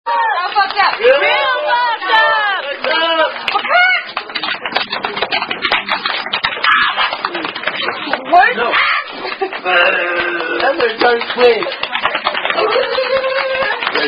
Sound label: Drinking Game; Thumper!